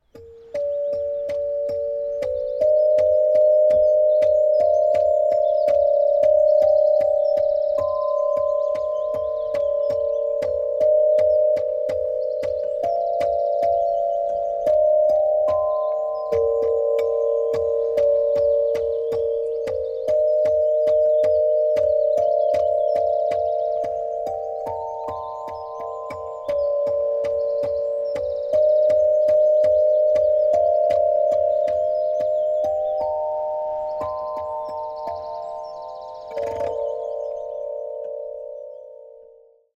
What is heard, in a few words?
marimba calypso